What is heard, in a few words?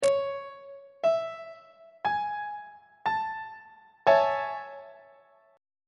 b double flat major 7th 1st inversion on treble clef